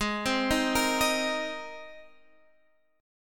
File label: Gsharp Major Add 9th, Guitar, Standard; arpeggiated; x,11,10,8,11,11